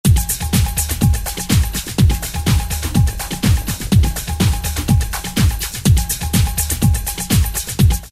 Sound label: Jungle 124, Dance; Roland SR-JV80-06